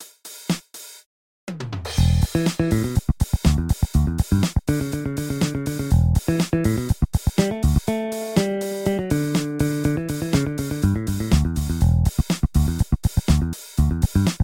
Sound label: fingered bass; bassesland